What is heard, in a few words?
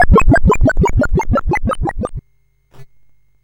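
Nebulus, MOS 8580 SID chip music, games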